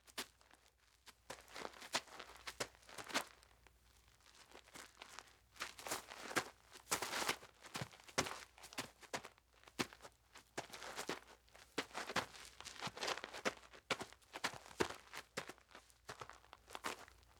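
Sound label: Footsteps Small Gravel Grass Dirt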